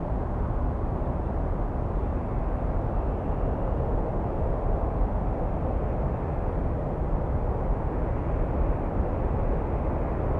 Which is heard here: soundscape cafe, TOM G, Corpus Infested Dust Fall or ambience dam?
ambience dam